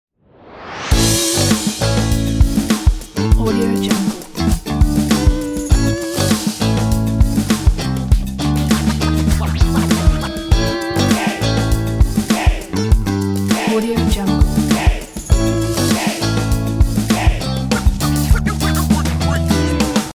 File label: funk prime logo